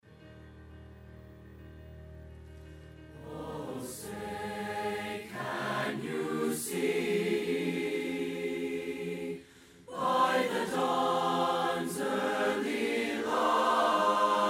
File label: Chorus National Anthem